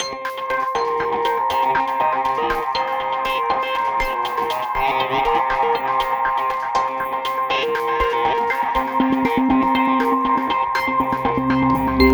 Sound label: Brutal Hybrid Battle Game Loop 120 Bpm Cm; Strike Team Gladius; Rise Of The Machines